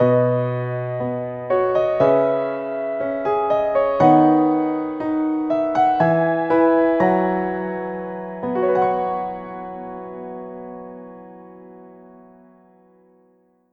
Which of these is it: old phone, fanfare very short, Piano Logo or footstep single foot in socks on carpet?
Piano Logo